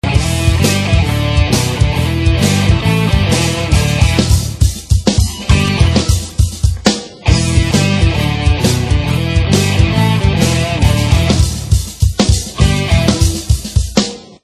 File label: drums